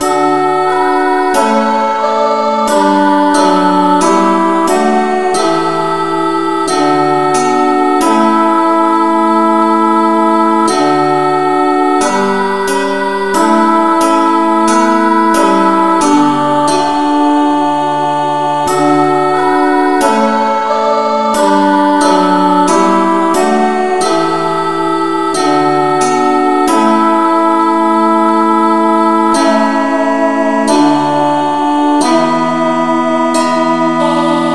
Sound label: no vocal